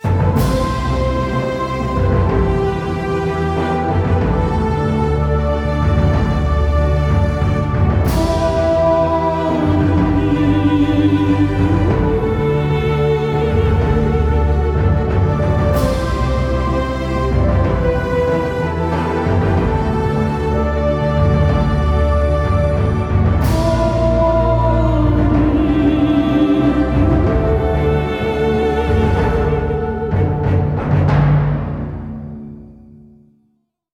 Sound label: Victory; Armello The Bandit Clan